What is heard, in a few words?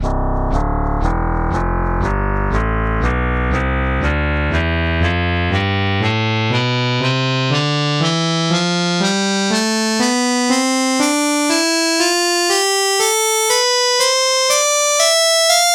muted trumpet tonleiter